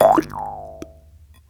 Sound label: JEWS HARP; Jew's Harp & Spoons